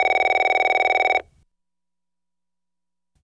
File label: TELEPHONE; SIMUZENSOUND